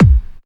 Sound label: dance 1, bassdrum; Ueberschall; Techno Trance Essentials; DRUMS; BD DANCE2